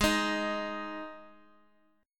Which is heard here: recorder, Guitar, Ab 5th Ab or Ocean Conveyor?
Ab 5th Ab